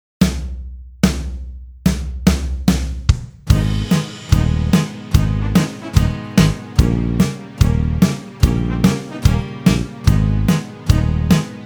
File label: INSTR